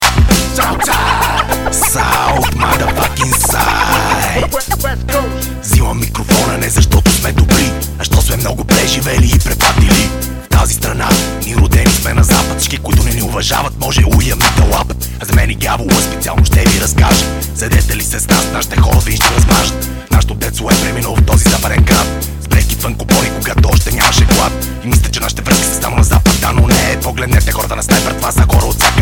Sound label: Hip Hop